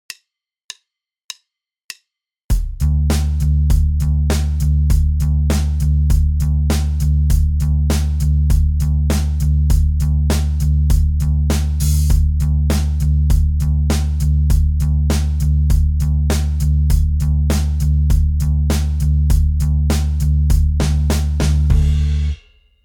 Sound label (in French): contretemps et syncopes binaires tempo 100